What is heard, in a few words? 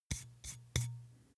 glass bottle handstroke sequence fast